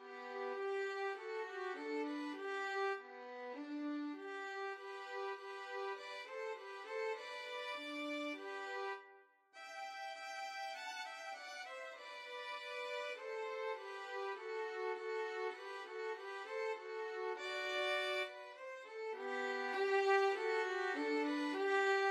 military sinfonia violin duet

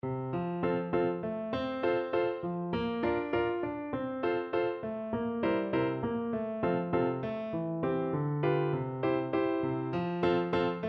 schumann, piano

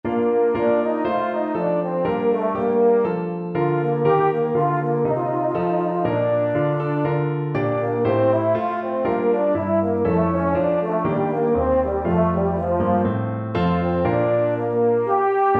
EUPH, euphonium

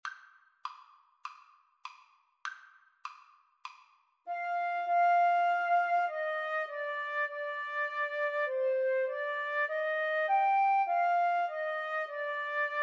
Flute Clarinet Duet

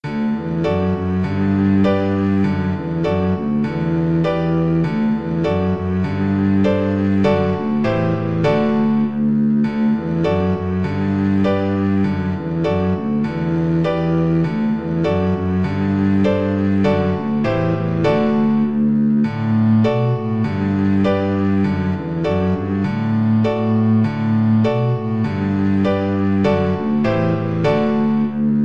mattachins, double bass

